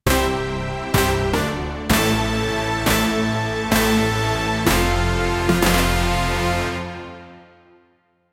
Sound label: the victory sound from, mobile game